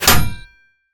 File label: Bullet Impact Metal Heavy